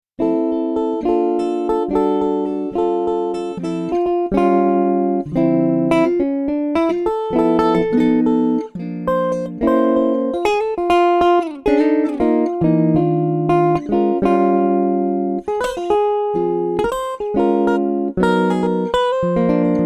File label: Jazz Guitar Solo